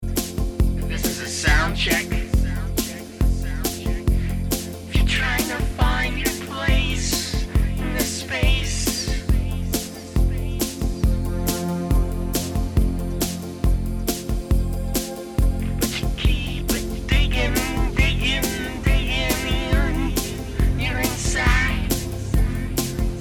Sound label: Soundcheck